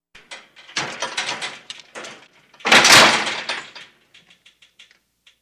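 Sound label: Metal Gate Open Close, gate & big rolling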